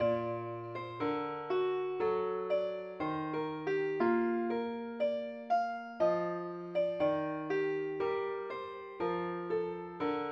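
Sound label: mandolin key b flat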